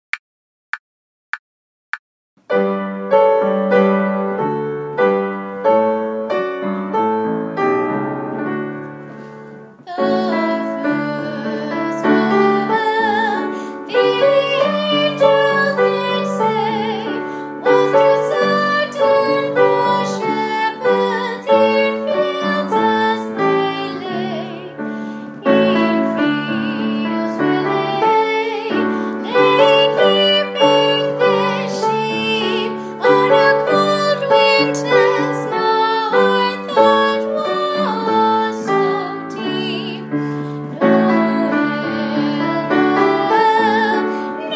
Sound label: Noel vocal guide; carols backing track